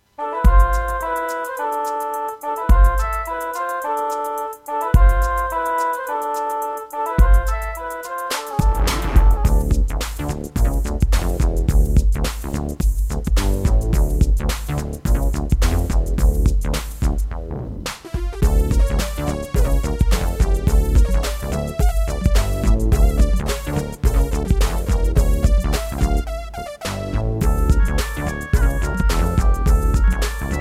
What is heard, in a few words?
Egypt, Roland SC-8850; Lost Vikings